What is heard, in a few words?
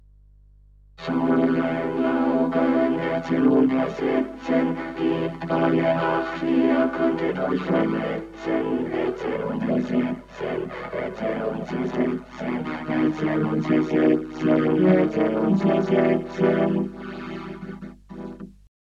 Vocoder Ensemble; Mikme Vplus